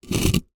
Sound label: foley concrete block movement hard ground; zapsplat